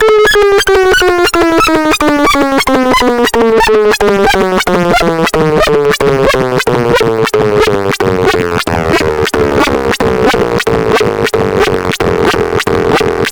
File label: SONAR, Hard & Loud Techno